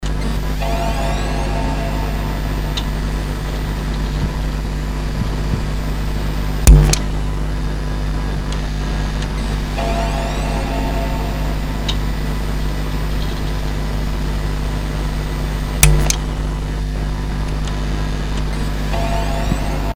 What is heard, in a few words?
mac power board sound